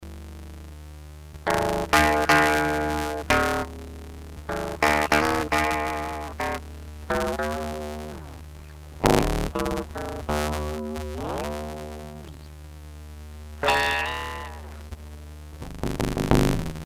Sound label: warp633 guitar; modular